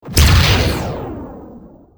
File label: Pulse Phaser; Star Trek